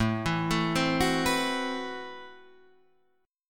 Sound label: A Minor 6th Add 9th A, Chord; Am6add9 Chord {5 7 7 5 7 7} arpeggiated